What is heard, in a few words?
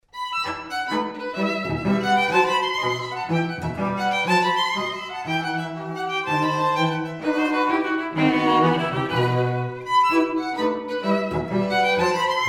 trio